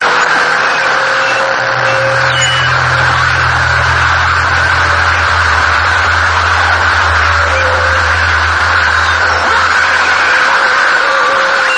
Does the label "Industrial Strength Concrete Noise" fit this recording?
Yes